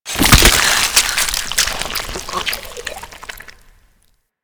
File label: Rip In Half, Flesh; Warframe